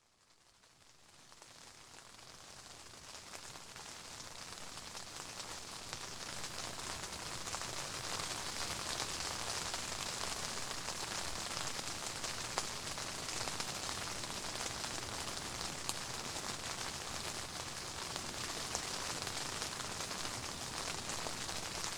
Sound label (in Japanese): 雨に打たれるテント, 環境音; 音声なし; きみと旅する自然の唄【ASMR環境音】